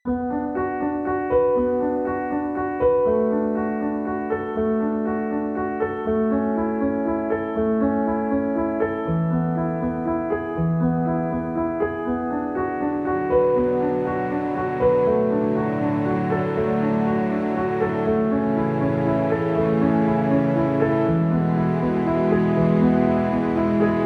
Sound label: Peaceful Mind, MUSIC